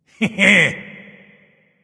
"heh heh"; smite; voicepack; ullr

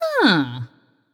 nv confused; smite; voicepack; morgan le fay

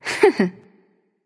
hehheh; smite; pele

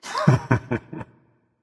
heh heh berserker; cuchulainn

